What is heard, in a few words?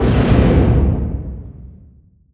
distance ship hit small effect; Hearts of Iron IV Death or Dishonor